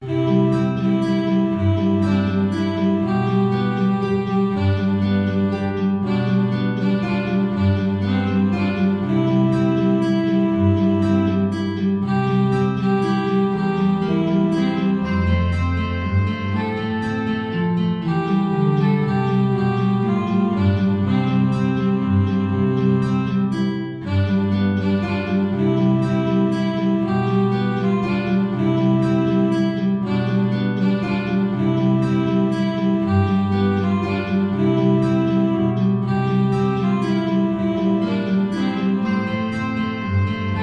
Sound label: sweetly the bells are ringing